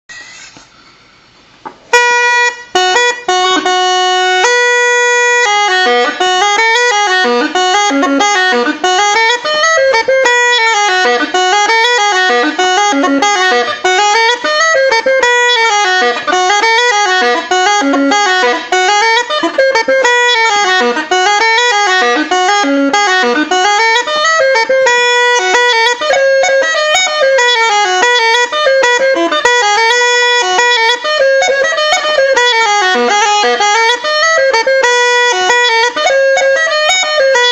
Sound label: chanter